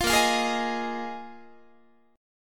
Bb 7th Suspended 4th Bb Guitar 4A,4E,5A,5D,5G sharp,6C sharp; strummed